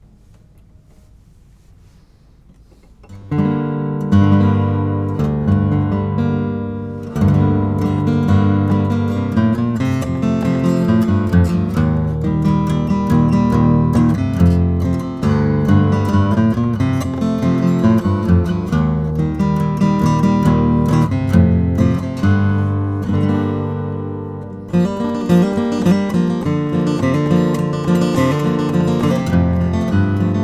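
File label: martin 000 18 natural flat pick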